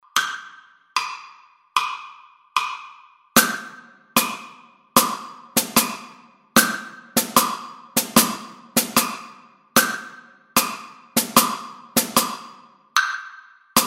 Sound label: drum set